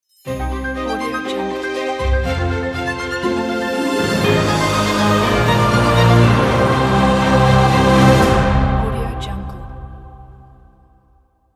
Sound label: positive orchestra logo